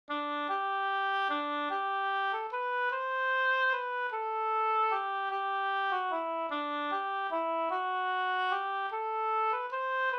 vals Irsk vals; Fanny Powers

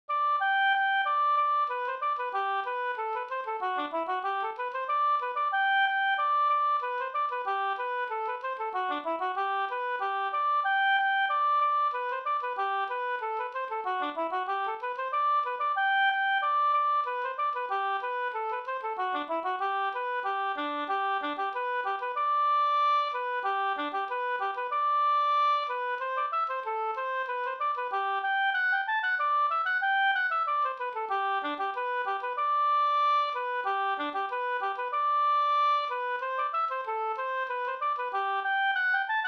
polka polkett; e Gas-Anders Up Nr2

